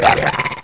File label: Worm Die